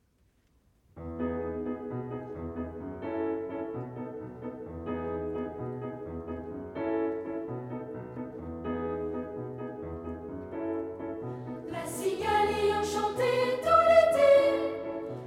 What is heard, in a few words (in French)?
voix piano